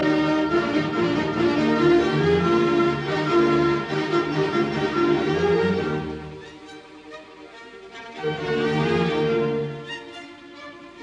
Piano Concerto No 9 in E flat major K 271 III Rondo Presto; Mozart; Jeunehomme; Menetto Presto